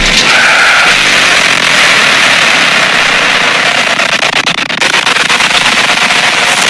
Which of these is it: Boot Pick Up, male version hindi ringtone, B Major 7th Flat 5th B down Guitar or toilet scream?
toilet scream